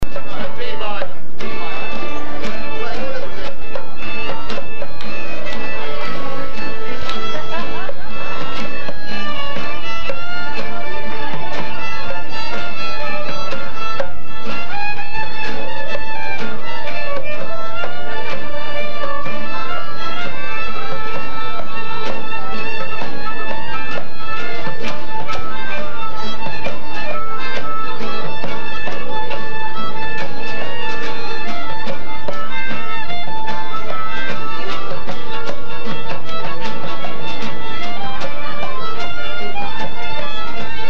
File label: Klezmer Barndance